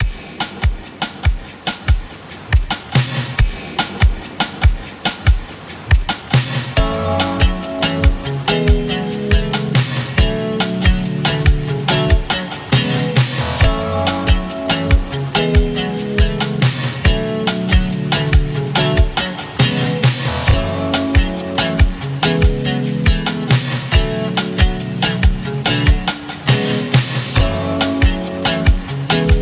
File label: Acid Rain